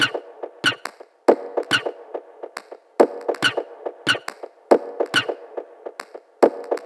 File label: Bpm140 Perc Loop, Dubstep; Antimatter